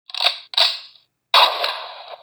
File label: shotgun